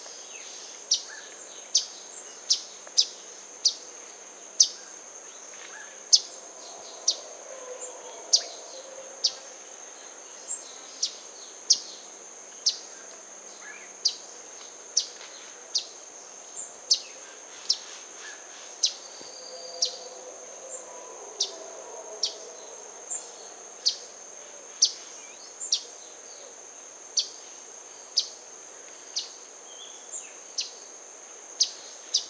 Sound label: Chestnut-sided Warbler, Setophaga pensylvanica